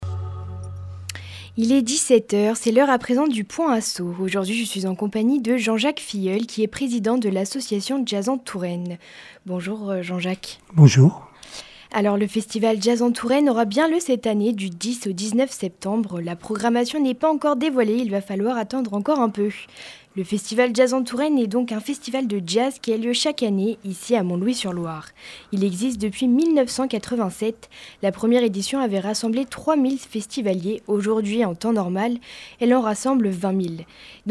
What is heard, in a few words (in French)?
jazz; touraine